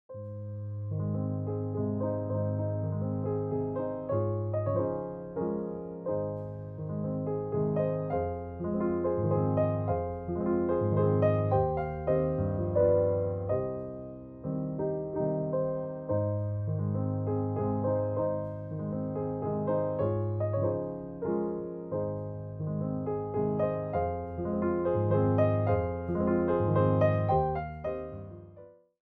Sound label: Waltz in A flat major, piano; classical piano favorites from the romantic period vol 1